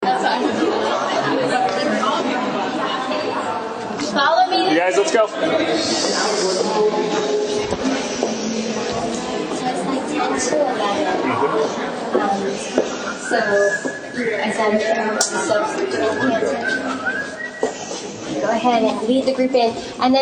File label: whistle